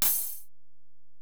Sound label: Ride, Alesis DM-PRO